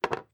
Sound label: chess